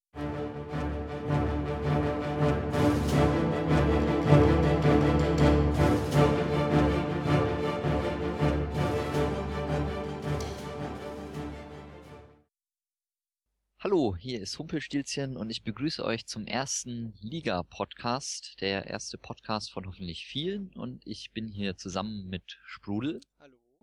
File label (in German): musikkaaaa